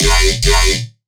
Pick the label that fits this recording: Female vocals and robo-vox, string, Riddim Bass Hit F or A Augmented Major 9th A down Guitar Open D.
Riddim Bass Hit F